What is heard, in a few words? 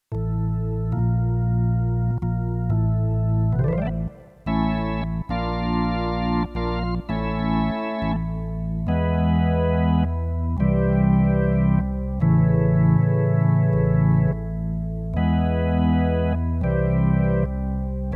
organ prelude